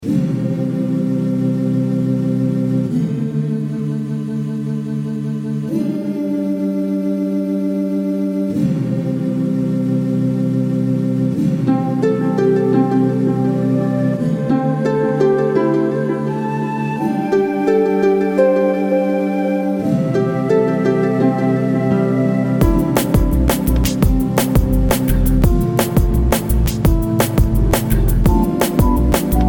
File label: Pharoanic song Disco